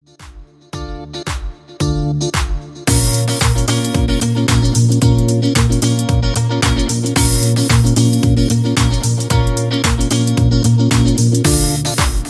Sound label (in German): musik hoerprobe